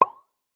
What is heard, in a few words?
reaction sound